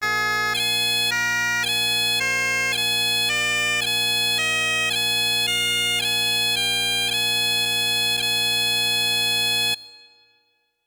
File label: High G Doubling, piping